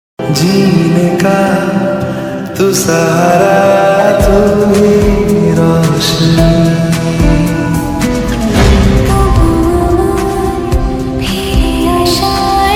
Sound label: mashup ringtone